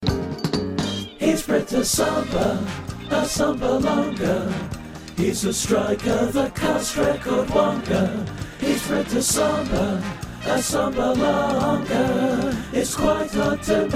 song

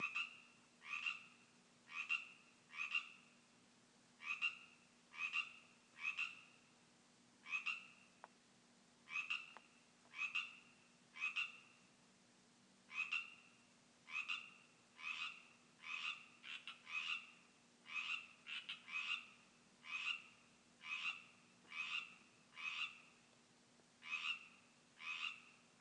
pacific tree frog chorus in my yard

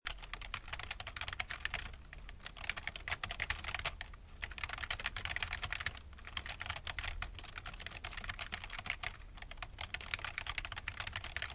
typing on the keyboard

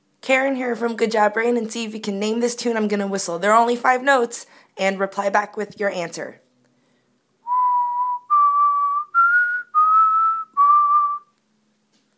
whistle; name that whistle

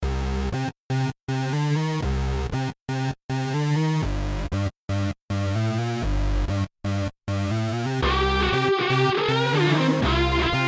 beats

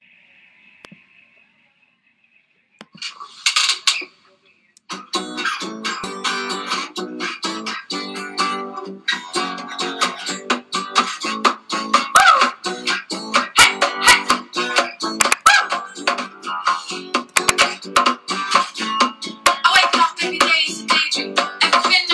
accoustic cover drums

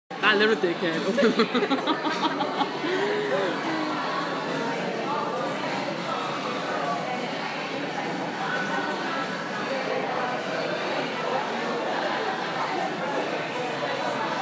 sounds at the cafe; trent cafe

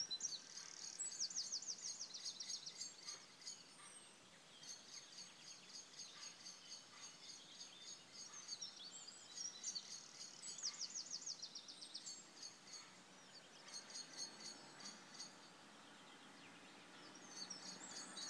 birdsong

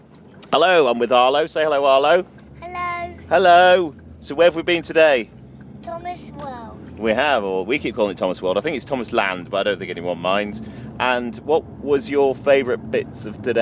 whistle

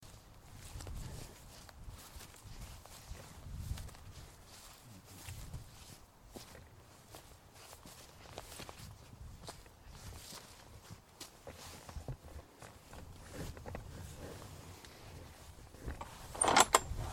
stream walk

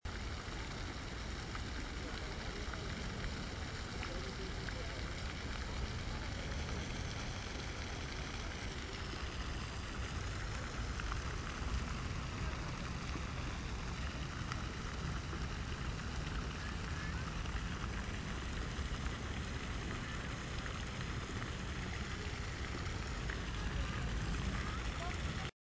fountains in hyde park london

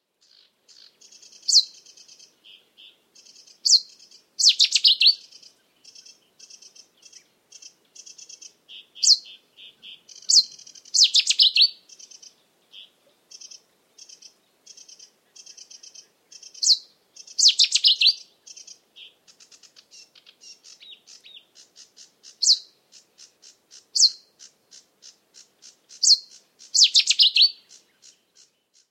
night song of the cetti s warbler

